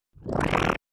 Slime gun

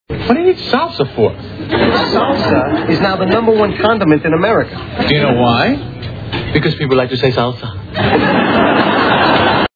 salsa; Seinfeld

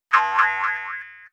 Boing spring

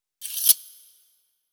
Sharpen metal

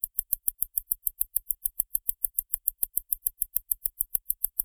Rusty clock

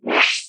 Electric zap

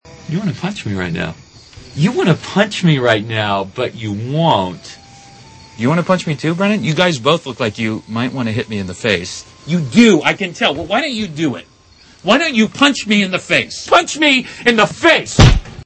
punch; Step Brothers

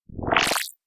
Flash laser

